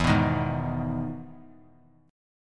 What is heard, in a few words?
E Major 7th E down; EM7 strummed; JGuitar